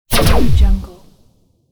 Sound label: magic zap